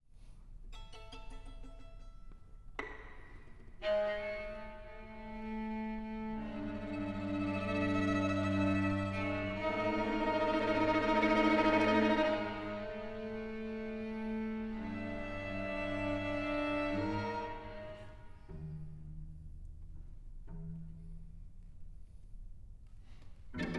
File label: string quartet; esterhazy quartet